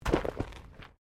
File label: Body movement Impact Roll Gravel